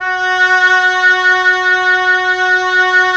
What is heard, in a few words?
WND OB F, Oboe f; Roland - LA Composer vol. 4